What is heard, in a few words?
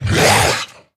Zombie Monster Attack; Undead